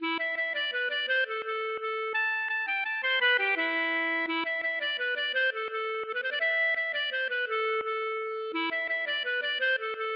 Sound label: mazurka